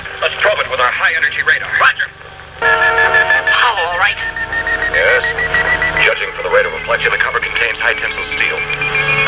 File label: high energy radar; Actual 20th Century/Fox Special Effect sounds; BATMOBILE